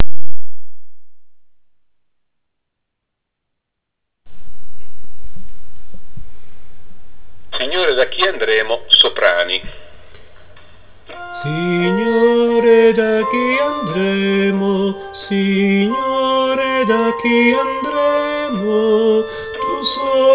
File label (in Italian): C Soprani